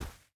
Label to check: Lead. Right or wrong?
Wrong